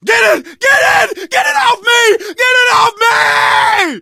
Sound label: scream while pounced, survivor voice